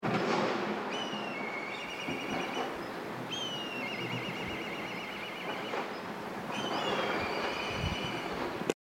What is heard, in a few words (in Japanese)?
tori tobi